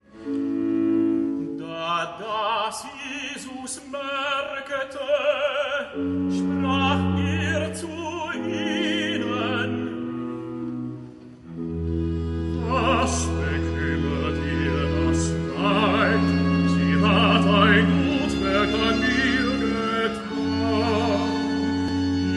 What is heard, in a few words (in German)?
Rezitativ, bariton; hoerproben